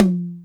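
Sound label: Hi Dbl, Alesis D4 drum machine tom; 300 Drum Machines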